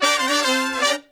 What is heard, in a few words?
BRAZ LICK, BRASS LICKS